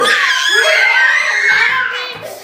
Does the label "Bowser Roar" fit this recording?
No